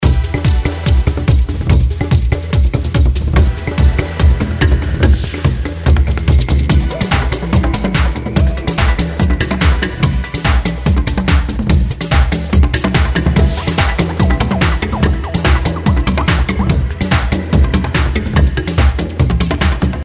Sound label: Tribal Dance; tiki-beats; eyecon